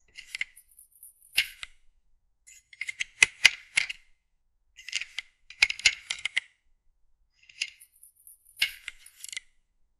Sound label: smooth zoom in seamless